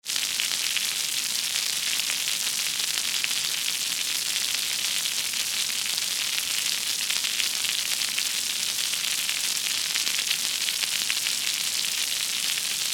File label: Quiet Rain